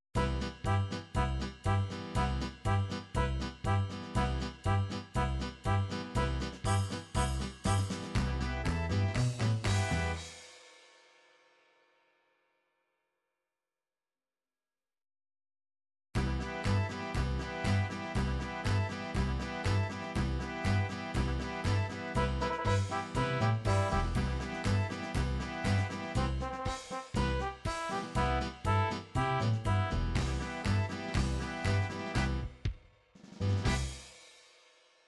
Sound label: polka, midi